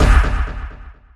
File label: Menu Scifi